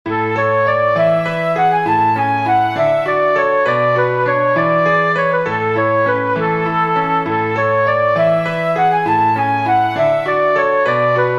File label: fake folk dance